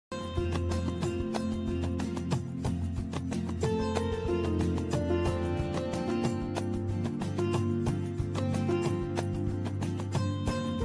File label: diwali background music; happy diwali music